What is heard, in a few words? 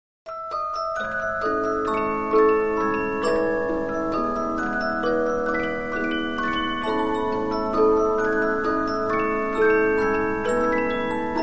Polyphon, 15inch disc musicbox